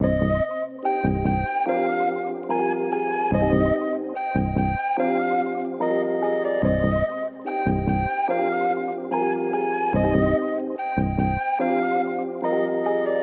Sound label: Melody Loop - 145 BPM C Min; Cymatics; Oracle Sample Pack